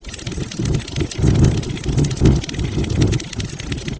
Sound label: fire